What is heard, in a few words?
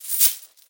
Purchase, notification